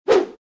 whoosh

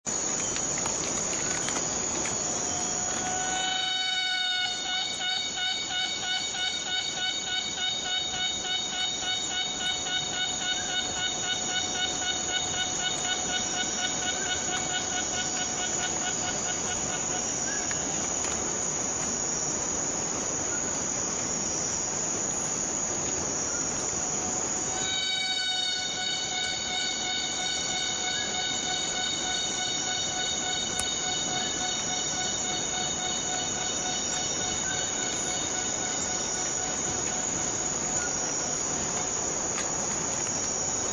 borneo rain forest cicada

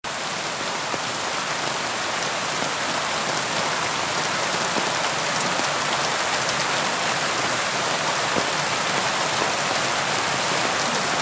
night rain with distant thunder

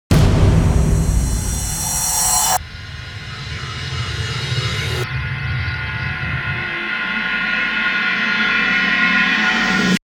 reverse cinematic impact trailer

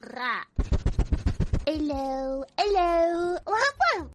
sniff sniff womp womp

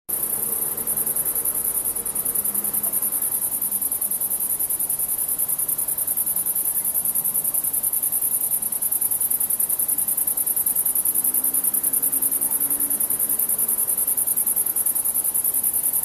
chirping insects at night

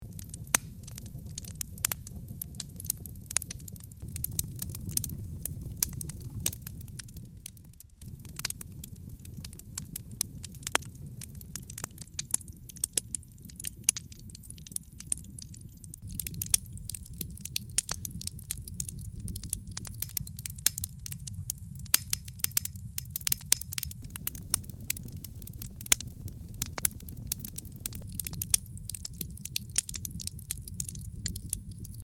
fire sound

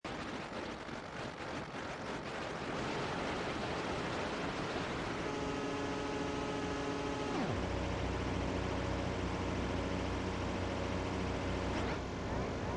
new connection

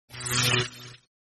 shocking grasp

